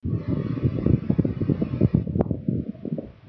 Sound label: Rolling Boulder